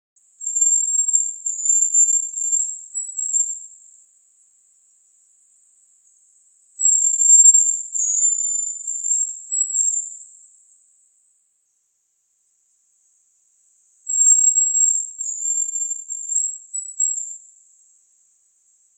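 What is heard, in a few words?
hazel grouse voice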